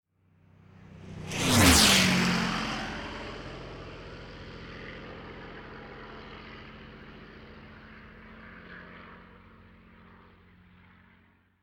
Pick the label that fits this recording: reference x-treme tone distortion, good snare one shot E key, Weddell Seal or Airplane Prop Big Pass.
Airplane Prop Big Pass